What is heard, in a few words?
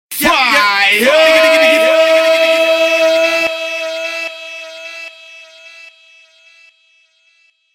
Fire Sound; DJ Effect